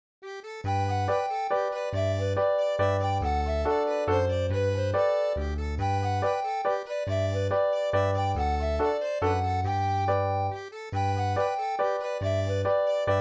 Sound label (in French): mazurka; du grand frise autre